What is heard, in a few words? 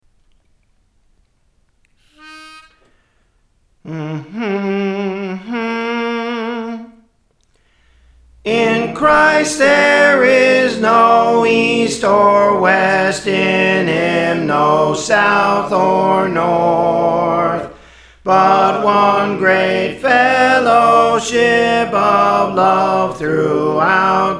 Soprano; Praise For The Lord Training